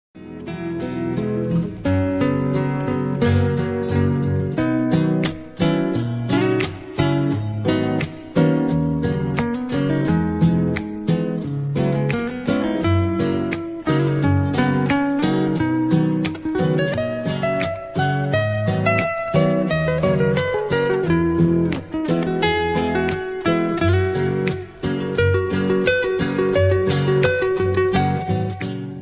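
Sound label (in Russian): инструментальная музыка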